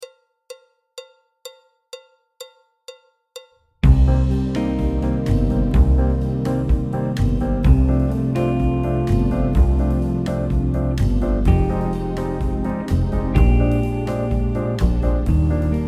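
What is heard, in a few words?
backing track, Jazz; Jazz Syllabus Level 1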